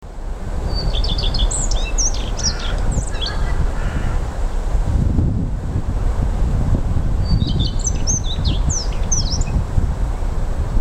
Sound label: Phoenicurus phoenicurus male song, Irkutsk